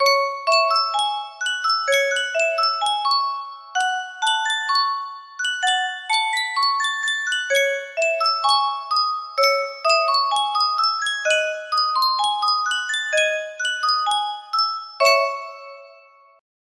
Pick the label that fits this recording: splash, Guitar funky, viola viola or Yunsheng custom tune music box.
Yunsheng custom tune music box